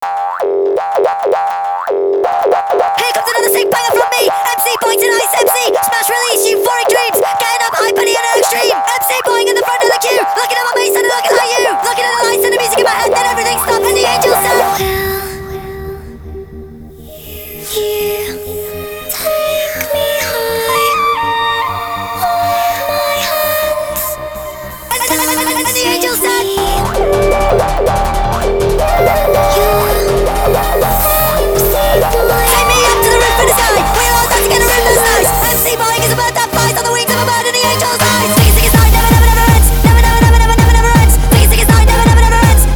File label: Boing Beat